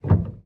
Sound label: piano release